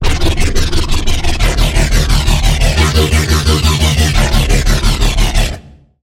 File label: Bass - Realm Distortion; Routed Sound; Vital Expansion - Chaos Bringer Vol.1